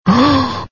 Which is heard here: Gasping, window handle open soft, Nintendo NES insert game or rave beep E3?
Gasping